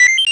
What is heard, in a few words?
spot discovered, pda; Enhanced Graphical User Interface